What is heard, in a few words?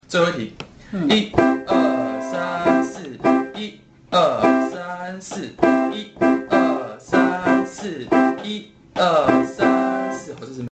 rhythm